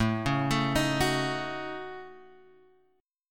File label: A 6th Flat 5th, guitar; A6b5 Chord {5 4 X 2 4 2} arpeggiated